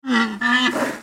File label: Pet Buffalo Happy; creatures pets; Lego